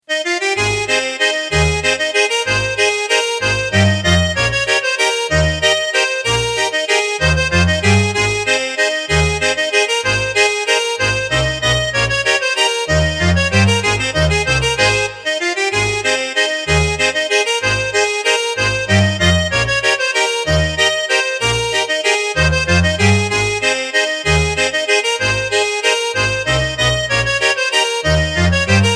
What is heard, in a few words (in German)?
Walzer